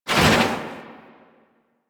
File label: Operator Focus Dash Wave; Warframe; Operators; Powers; Tactic